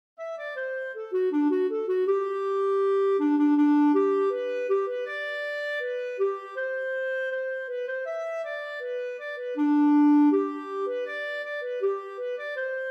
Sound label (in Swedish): Skoklostermarsch